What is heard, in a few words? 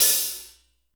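hi-hat half, acoustic set; drum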